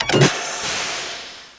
train use; vendetta; plats

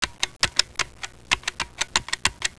buttons; ambience; blackops

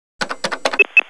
button; vendetta

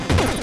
shot

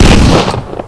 sawed off fire; weapons; vendetta

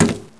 metal; blackops

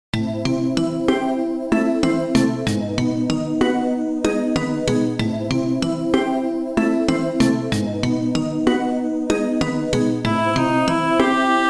maze bgm; frightmanor

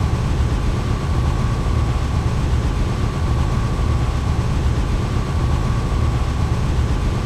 huge fan; amckern

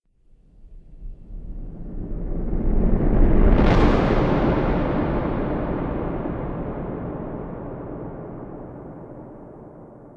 distant angel warp; st mission; hs c4

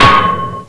lead pipe hit; weapons; vendetta

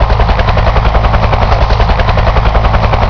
huey rotor

